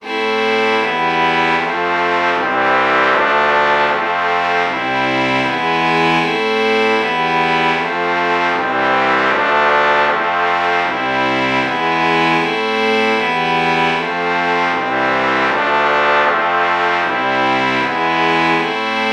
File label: violin trumpet chord morph